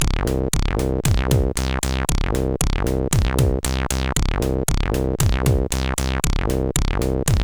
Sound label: Bow Chicka Bow Bow, CSG8580R5; Eco